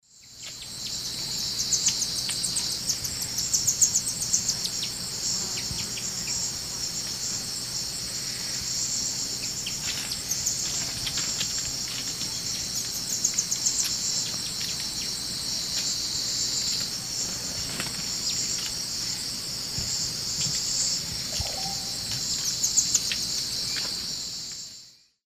Epinecrophylla ornata, feeding calls, S. America Eastern Lowlands